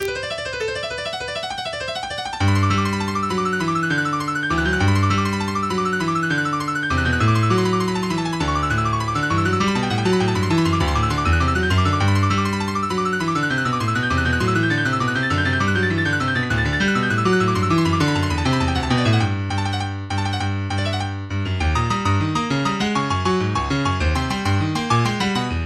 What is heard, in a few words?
Etude Toccatina